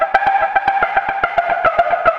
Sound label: a higher loop